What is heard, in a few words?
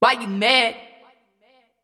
120 vocal wet Cmin; why u mad